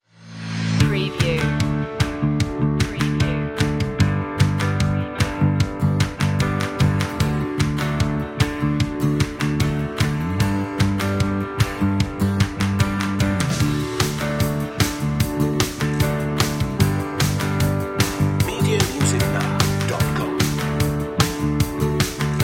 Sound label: bright future inspiring